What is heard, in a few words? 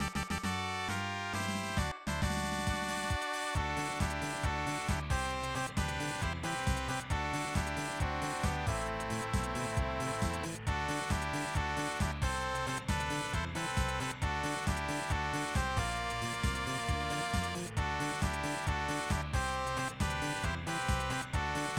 Fanfare; Final Fantasy [PS1 + PS2]